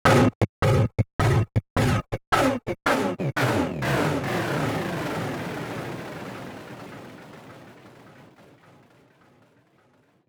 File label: Beat Stop; SFX; Experimental - Other